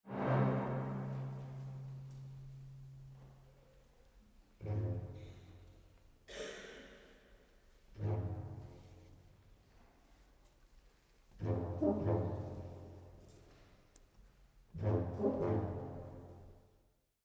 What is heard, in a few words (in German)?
audio restauration; createc